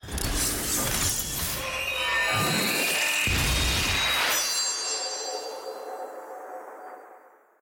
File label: orb upgrade; clash